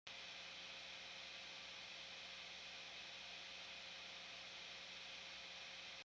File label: Amp Guitar Noise Fingers